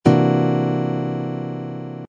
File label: c dominant seventh sharp eleventh chord voicing, guitar